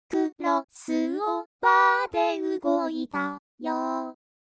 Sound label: crossover chorus